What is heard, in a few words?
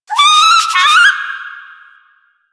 Rabbit Ambient Call; Duviri; Animals